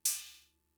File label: Cymbal, Fricke MFB-522, Drum Machines